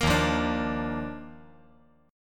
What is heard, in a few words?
D sharp Major 7th Suspended 2nd; strummed; JGuitar